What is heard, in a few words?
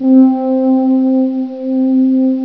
PAD BOW; Dark Forces Demo; Dark Forces Instruments